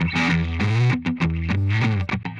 Push Guitar Riff, Rock; Guitars

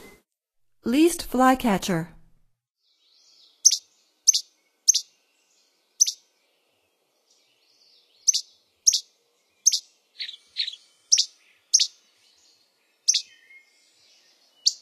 Least Flycatcher; North America; Stokes

